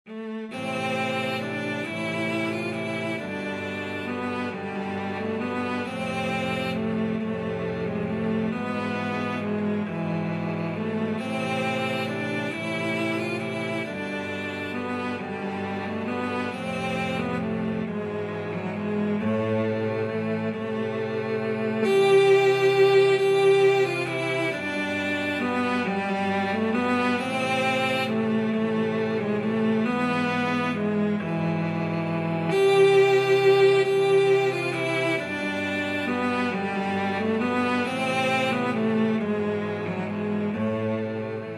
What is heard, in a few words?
cello quartet